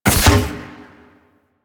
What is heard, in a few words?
Heavy Grenade Launcher Thunk; Warframe; Archwing